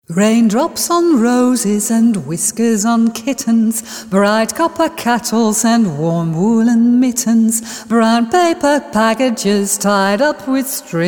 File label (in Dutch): zang